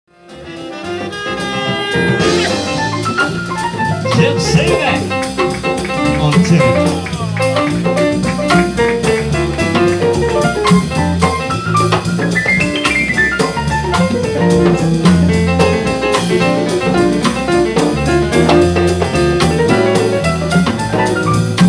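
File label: piano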